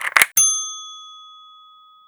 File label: Ammo Pick Up; Angry Goy II; SoundEffects